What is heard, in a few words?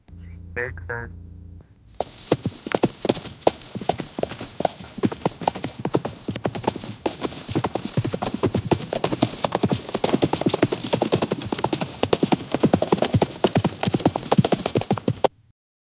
horses whinny types